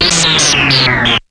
sample hold, synths, dancerap; The Sample Bank

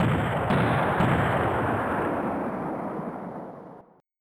comical; World of Sound

